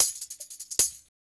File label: 075 Hip Hop Tambourine, Percussion; Digidesign; Pro Tools; Pro Tools Essential Loops